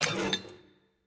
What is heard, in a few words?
Pipe Take